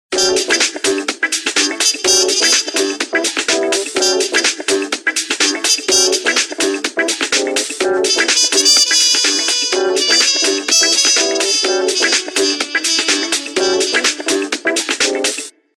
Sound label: Jazzy House; Samsung SC-01B; Pleasure